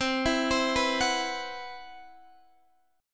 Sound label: C Major 7th Flat 5th C, Guitar DADGAD